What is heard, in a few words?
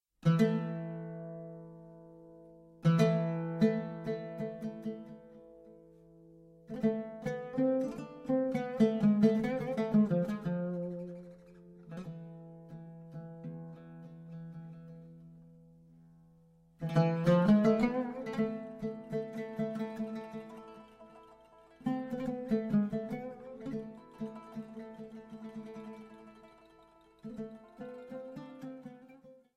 Bayaty Instrumental